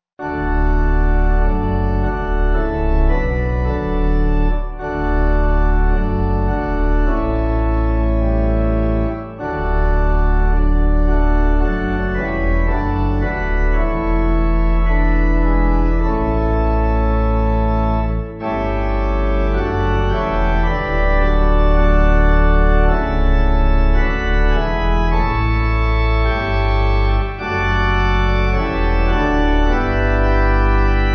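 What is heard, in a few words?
Pipe, Pipe Organ backing; Break Now The Bread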